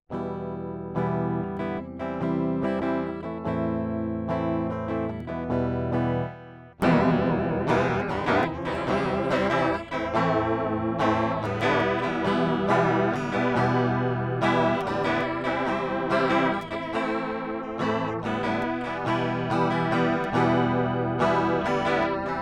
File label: Chorus